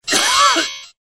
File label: cough buzzer